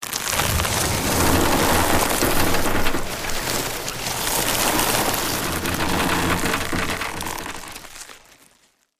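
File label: Event Village Vine Bridge Grows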